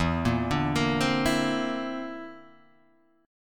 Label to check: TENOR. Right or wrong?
Wrong